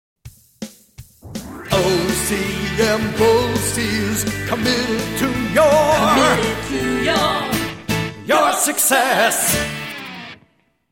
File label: jingle; BOCES